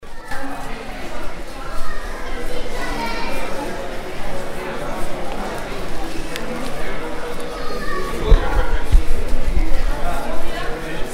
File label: Schools And Kids